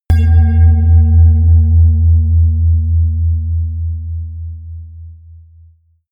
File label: melodic bass bell